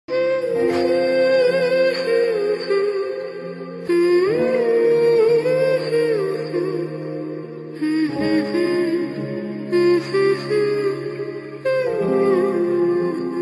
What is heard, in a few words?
ringtones 90s song; trending 90s ringtone music